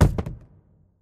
phys cube, physics

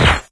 tmp, weapons; Source Engine; cstrike

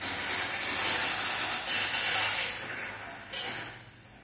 metal rattle, ambient materials; Left 4 Dead 2; Source Engine

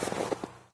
snow, footsteps

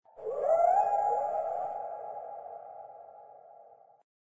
animal call unknown howl; Source Engine; tf; lair

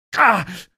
pain, male; episodic; episode 1; Source engine

